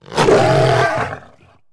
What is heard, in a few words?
tukata; Star Wars Knights Of The Old Republic I; XBOX